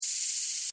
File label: Servo Mechanical SciFi Robot Toy; Scifi Servo F Mechanical Robot Small Motor Toy; Robot Sound Effects; BBFX